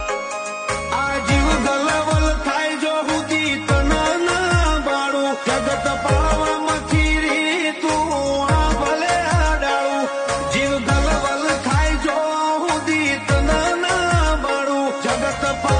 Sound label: Ringtone